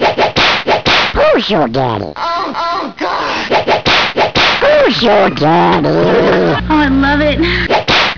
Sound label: whip